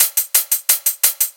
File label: PRCLP, Percussion Loops; neuro; Stanza; Drums